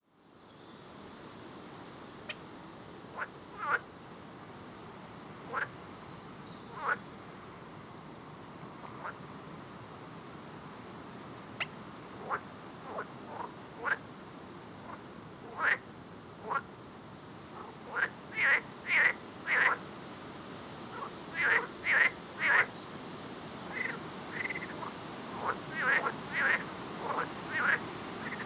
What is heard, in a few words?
Frog, Soundscape